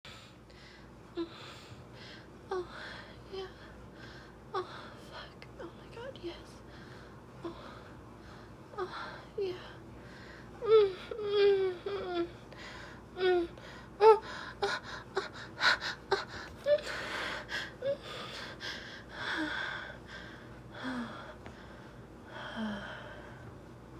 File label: Moaning